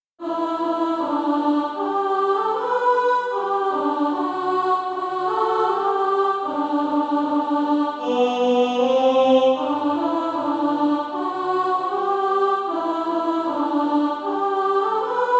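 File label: alto, Practise Music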